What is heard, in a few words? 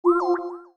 incoming message; bitrix